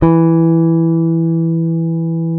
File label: P BASS SUSTN, E3; Sampleheads; Will Lee Bass Library